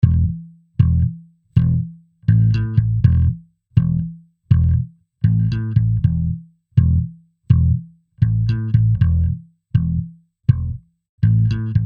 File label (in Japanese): ベース ゴーストノート なし